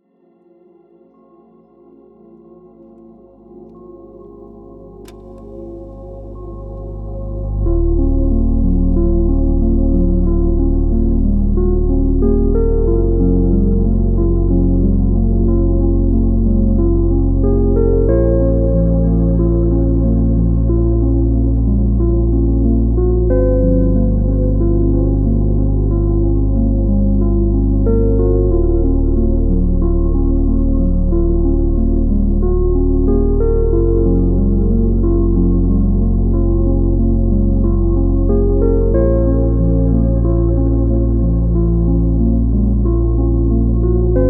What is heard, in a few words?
slowed & reverb